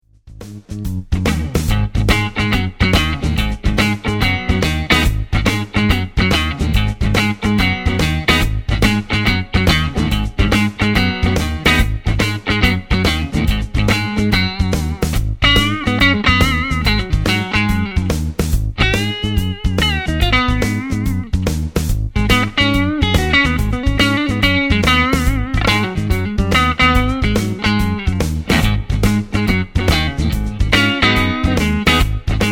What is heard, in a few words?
Clean Neck, Strat; schwarzbach; VP-Strat-IrishTour